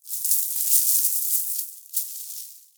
Mountain Pass Snow Hit Player; Warframe; The War Within

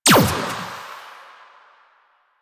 shot fire; Weapons; Warframe; Akarius Prime

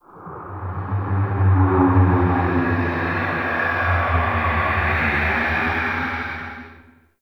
Ghostly Whoosh F; Ambience; Warframe; Man In The Wall

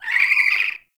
Owl Idle Chirp; Warframe; Duviri; Glyph Puzzle

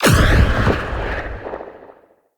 Spirit Proj Impact; Characters; Enemy; Mockeries; Abilities; SubBoss; Mockery

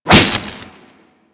Whip Impact Default; Warframe; Weapons; Corpus; Impacts

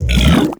Grendel Devour Regurgitate; Warframe

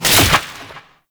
Conservation Orb Destroy; Warframe; Duviri

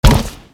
Grineer Step Metal, Footsteps; Warframe

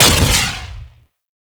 Explosive Impact; Weapons; Sapient Primary Explosive Impact E; Warframe

